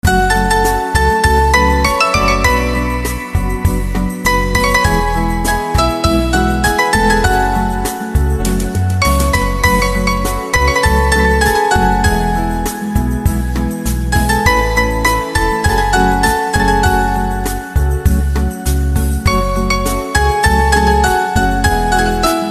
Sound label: Piano Instrumental